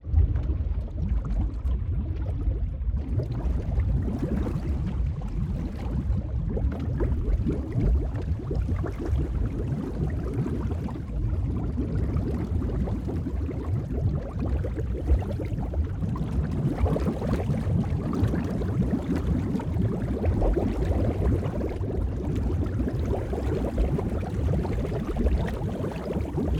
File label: Water Underwater Evolving Underwater Bubbles; Justsoundeffects